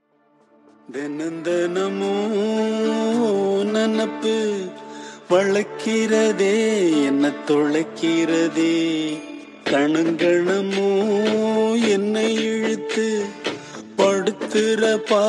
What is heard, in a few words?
ringtone